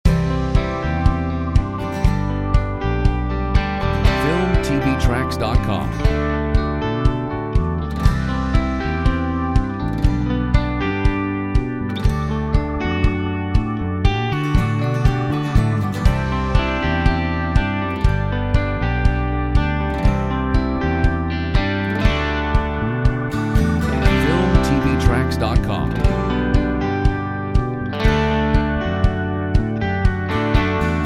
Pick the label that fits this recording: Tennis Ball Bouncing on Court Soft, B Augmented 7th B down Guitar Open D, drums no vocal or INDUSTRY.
drums no vocal